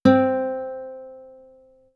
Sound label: c acoustic guitar